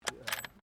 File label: RIFLE 308 EJECT CASING, Rifles, military; Guns